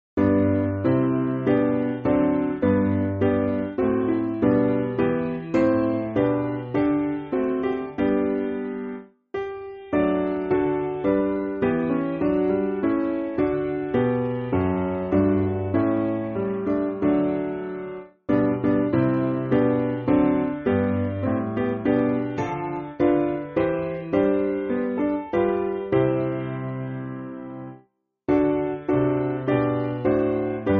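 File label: Piano, Free Music Backing Files for Churches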